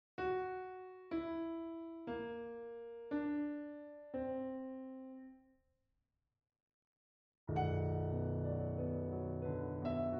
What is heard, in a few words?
improvisation